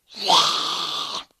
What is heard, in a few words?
mobs golum; extra mobs